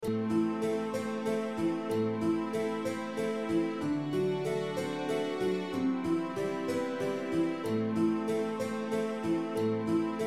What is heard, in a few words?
Dorian melody